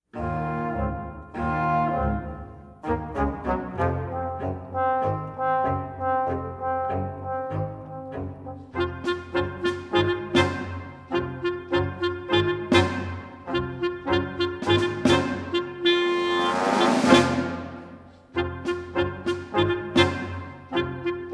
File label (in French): la chanson des marins, instrumental et melodie